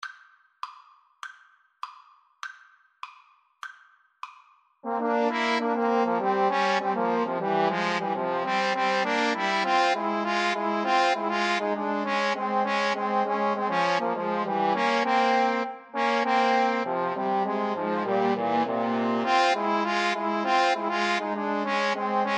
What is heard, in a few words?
Trombone Trio; thats a plenty